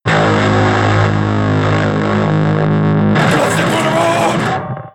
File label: Plastic Motor Boat